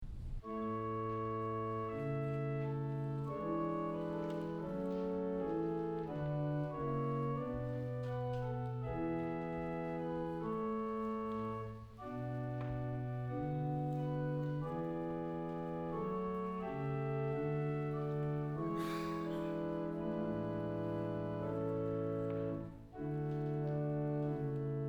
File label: Air With Variations; Stirling